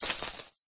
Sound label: chip put